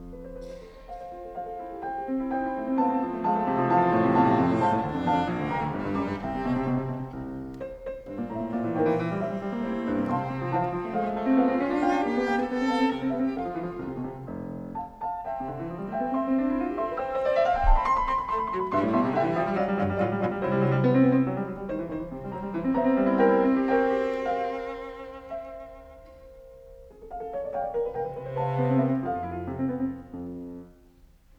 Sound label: in F Major, Cello; 12 Variations on Ein Mädchen oder Weibchen from Mozart's Die Zauberflöte